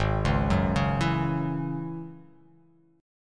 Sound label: Ab 7th Suspended 4th Sharp 5th Ab down; Ab7sus4#5 arpeggiated